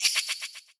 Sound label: laugh; HeavenStudio; sneakySpirits